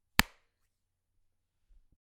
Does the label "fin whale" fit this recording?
No